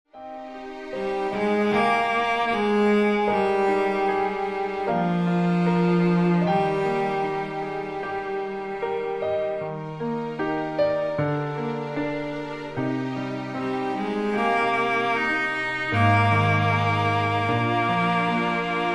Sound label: MIDI